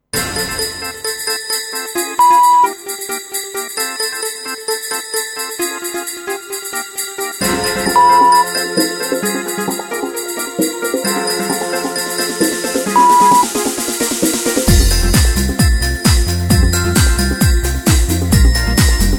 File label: XMas Club Dance 132